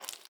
ui pickup meat; Rust

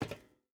footstep rubber boots metal walk; Rust

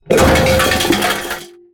objects, World of Tanks

